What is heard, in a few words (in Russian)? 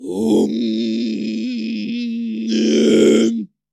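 странный мужской крик короткий "ом"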